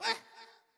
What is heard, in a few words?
Wimp Hurt